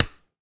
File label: Pickelball Paddle Hit Ground Hard, Ball Collision